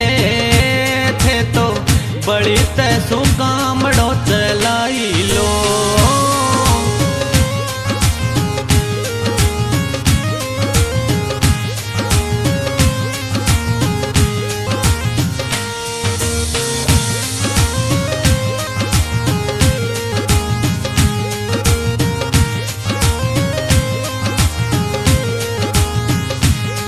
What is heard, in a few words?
superhit romantic rajasthani